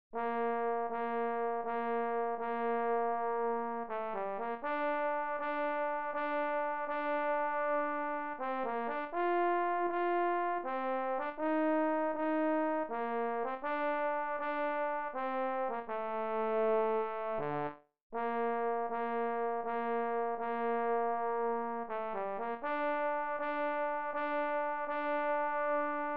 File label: trombone baritone